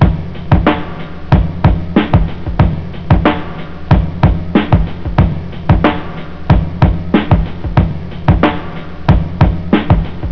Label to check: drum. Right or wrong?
Right